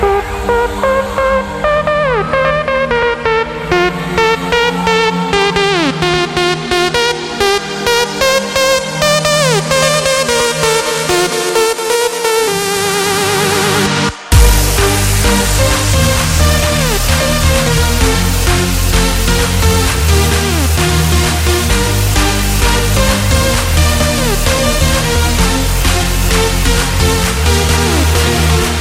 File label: new trance